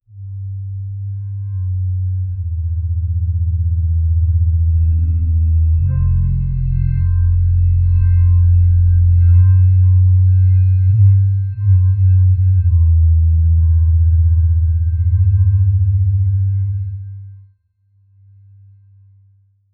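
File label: bass drops crescendo chime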